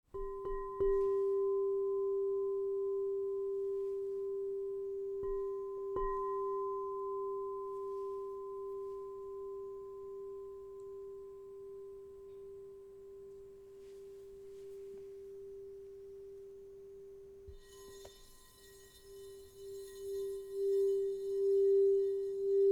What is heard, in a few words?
Crystal Tones Singing Bowls